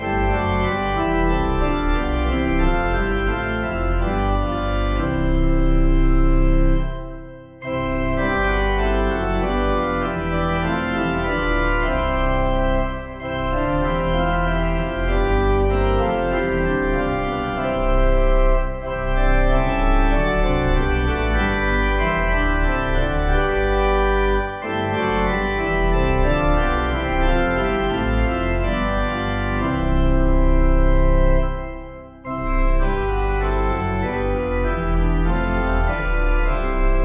Hymn organ; von himmel hoch; Hymnal 1982

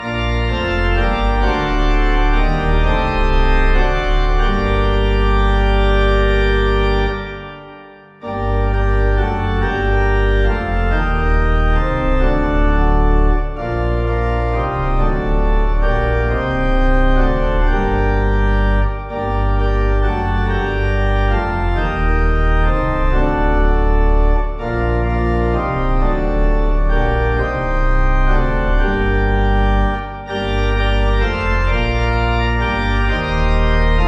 Pipe; If You But Trust; Numark; Hymnal 1982

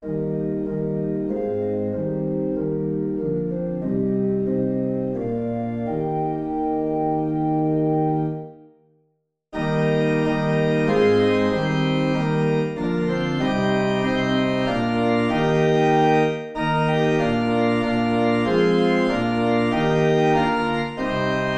Be Thou my vision Organ; Slane; Hymnal 1982

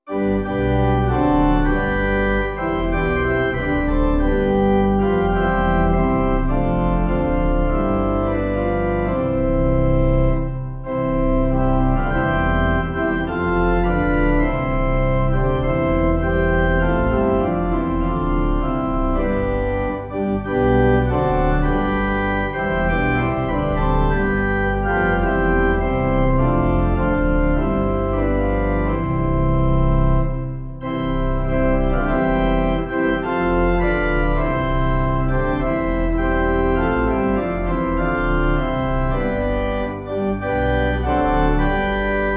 Hymn Truro Pipe; Hymnal 1982

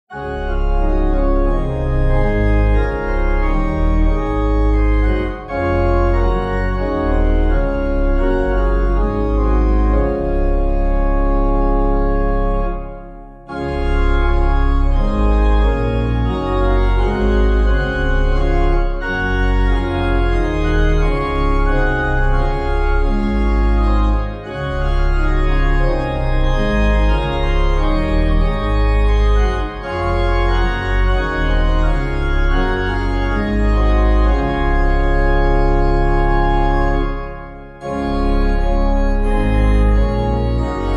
Hymn organ; In Your Mercy Lord You Called Me; Halton Holgate

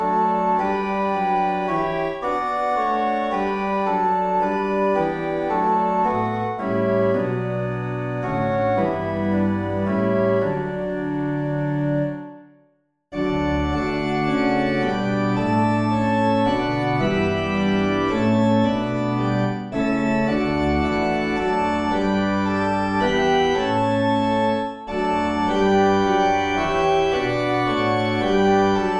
Hymn Organ; When Christ was lifted from the Earth; St Botolph; Hymnal 1982

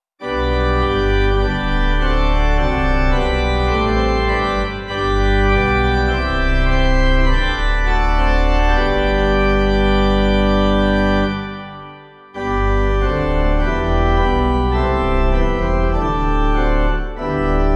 Hymn organ laus deo; Hymnal 1982

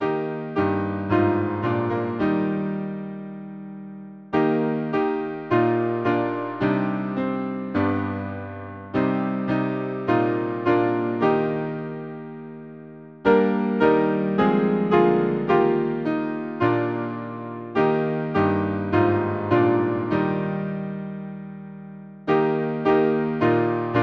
Hymn piano; Glory be to Jesus; Caswall

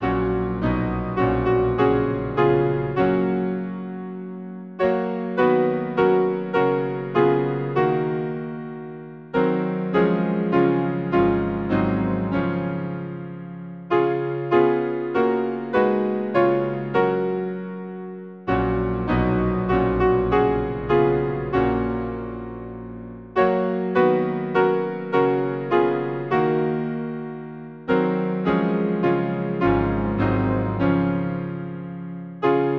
piano, Hymn; Thy kingdom come O God; St Cecilia

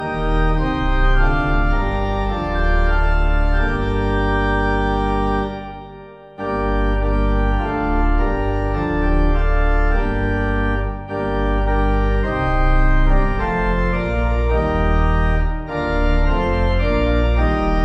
organ; nun komm der heiden heiland; Hymnal 1982

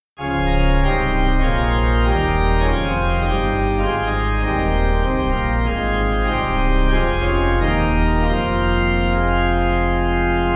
Hymn organ; O holy city seen of John; Morning Song

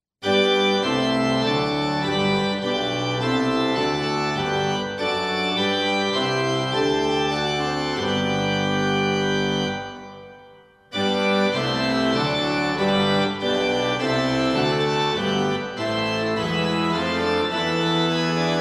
Hymn; Hark the Glad Sound; Bristol; Hymnal 1982

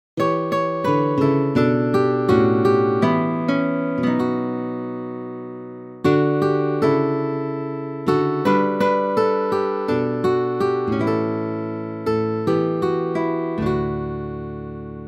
guitar; Hymnal 1982

